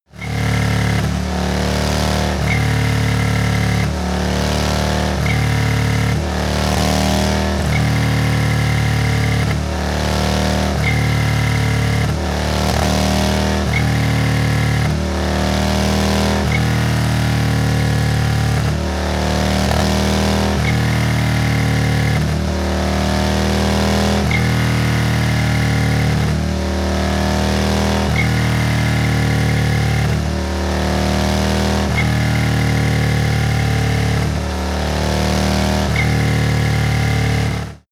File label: motorcycle harley sequences short thrusts